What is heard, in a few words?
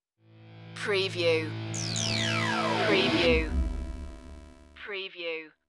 crash course stinger